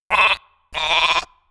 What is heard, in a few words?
Sheep Bleat Call; Weapons